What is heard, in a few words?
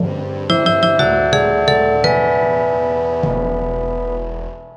victory; 勝利 - Shori